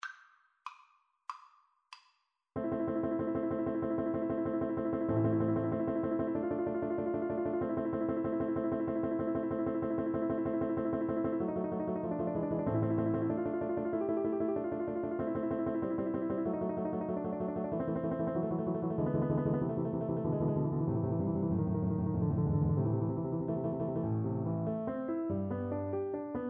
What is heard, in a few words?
Soprano Saxophone